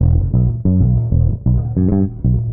bass; Loops; Phonky

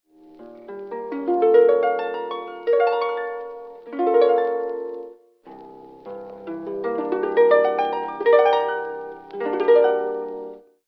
guitar, Phonky loops

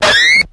screee; Zaps and Beeps

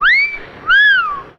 whistle sexy

